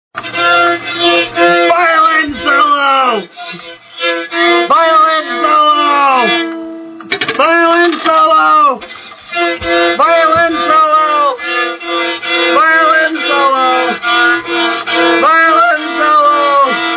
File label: Violin Solo